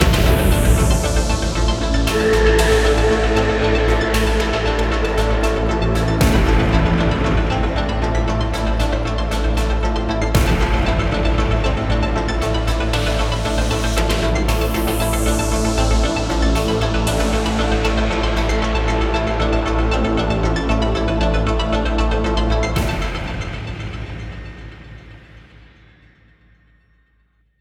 tension; By Burak Sound Bank; tension gerilim vol 22

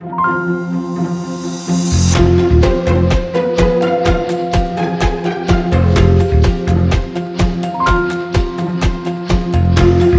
tension soft balanger; tension gerilim vol 12; By Burak Sound Bank

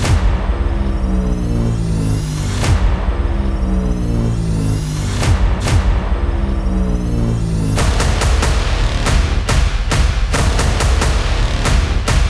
tension; By Burak Sound Bank; horror excitement thriller song

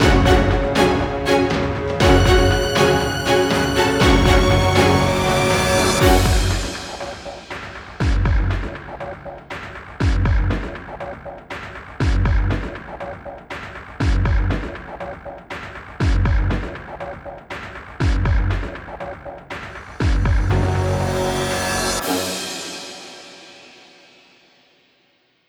tension; by burak sound bank; tension gerilim vol 45

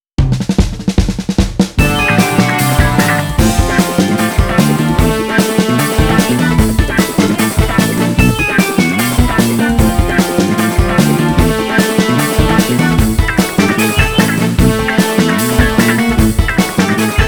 cheerful entertainment organ; by burak sound bank; cheerful vol 15

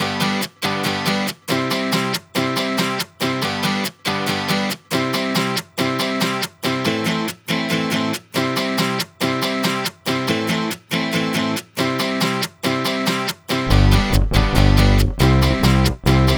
cheerful guitar comp; by burak sound bank; cheerful vol 11

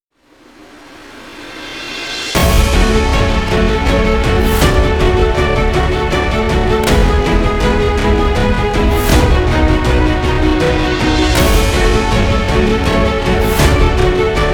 tension violin; by burak sound bank; tension gerilim vol 37

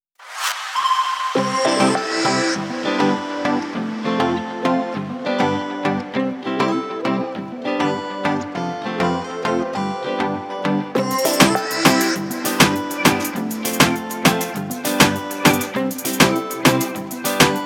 accordion, reggaeton; By Burak Sound Bank; reggaeton tema peketleri vol 1

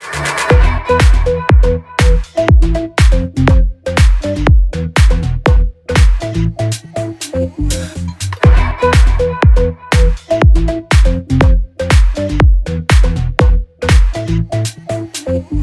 house electronic techno; By Burak Sound Bank

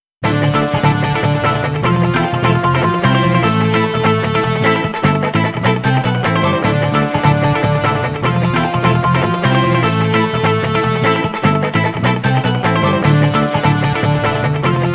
cheerful entert no drum organ; by burak sound bank; cheerful vol 15

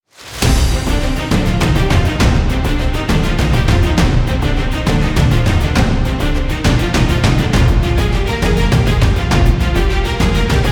tension; by burak sound bank; tension gerilim vol 6

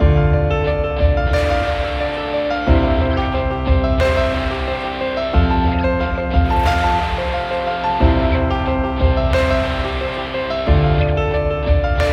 dramatic guitar no violin; by burak sound bank; dramatic dram vol 18

